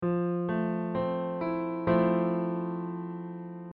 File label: FM7 Chord